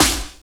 SNR, Tekno Industrial; East West

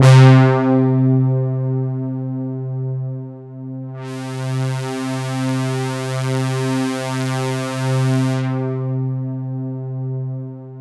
Roland JV 2080 JUNGLE BRASS C2

